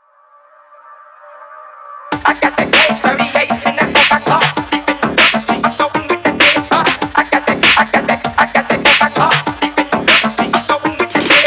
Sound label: phonk musics